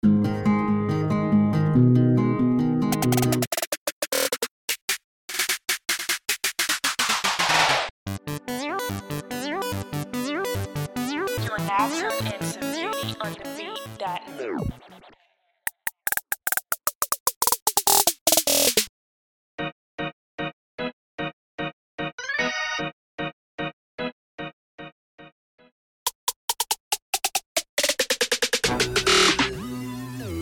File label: Loops Snare Rolls Sample